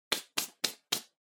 Hand Foley Brush Off

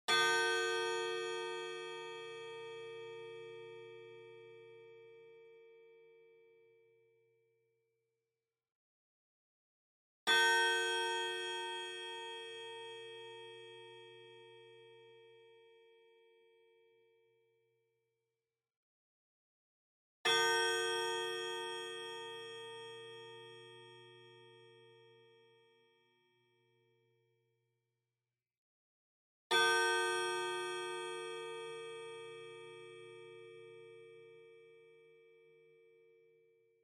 Pendulum Clock Chime High Set

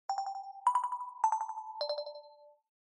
Smartphone Alarm Speaker

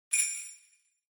Sleigh Bells Dry Shake Hard

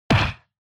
Hit Punch Dry

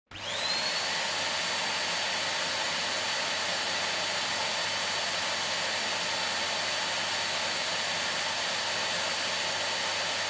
Vacuum Cleaner Vacuum

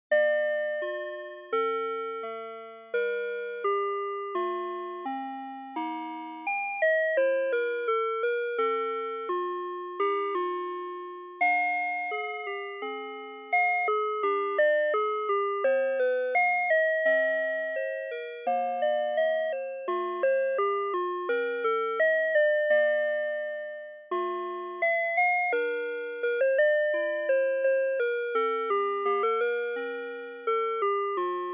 Telephone Music On Hold Air On The G String

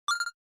SNES Racing Score

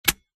Padlock-lock

